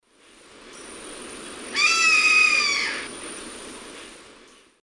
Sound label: Daptrius ater, flight call; S. America; Eastern Lowlands